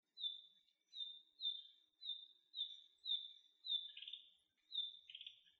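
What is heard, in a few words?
kibitaki call, narcissus flycatcher; jinaki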